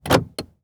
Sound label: Cars Honda Civic Unlock Doors Interior; transportation